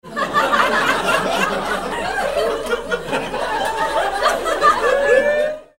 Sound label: laugh group